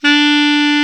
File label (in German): Klarinett; Alpine Volksmusik